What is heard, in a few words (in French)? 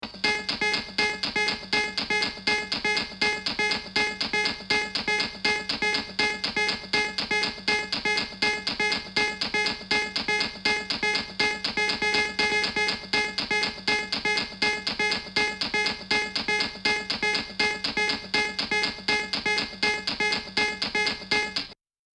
aproximativement percu; Loop Mania Sampler Pack